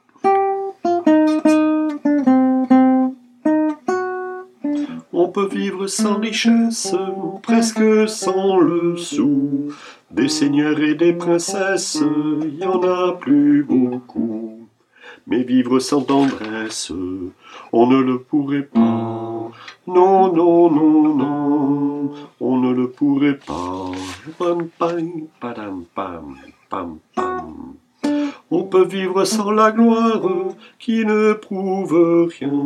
tendresse basse, chorale

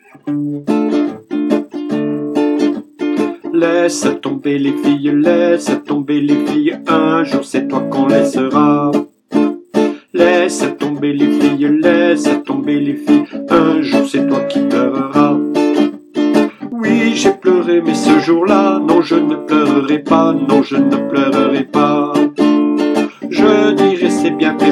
alto, chorale

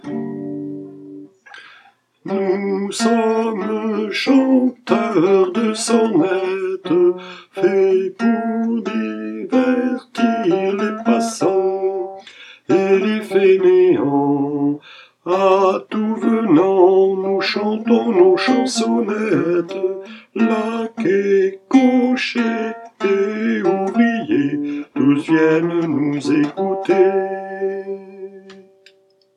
nous sommes chanteurs sop, repertoire chorale

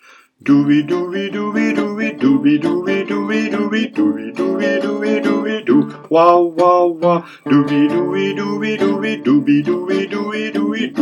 alto, chorale

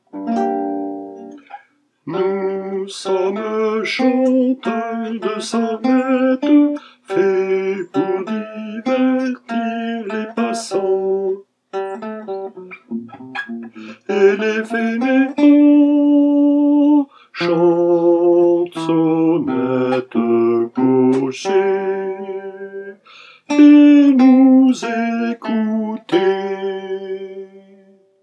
nous sommes chanteurs basse, chorale

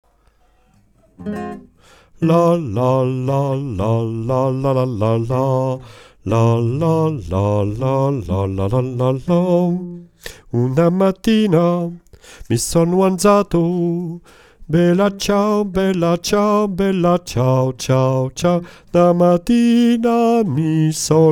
Bela ciao basse, chorale

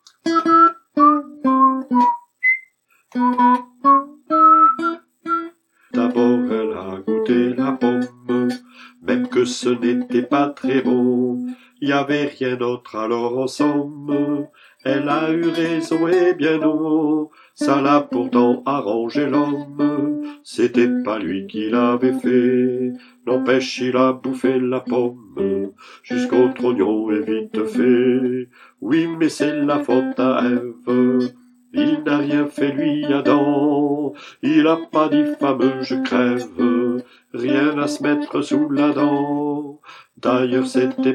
la faute a eve alto, chorale

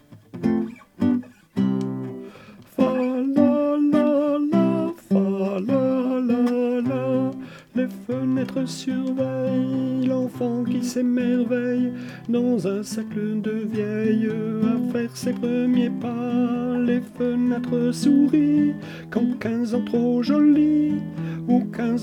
les fenetres tenor, chorale

